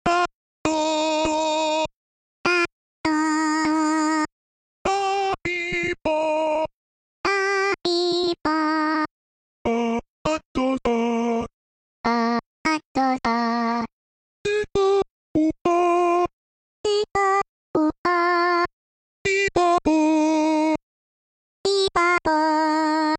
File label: Voices; Funkin